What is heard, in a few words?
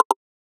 switch, Interface Sounds; Kenney's Sound Pack